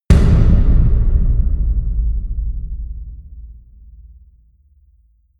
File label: huge kicks, percussion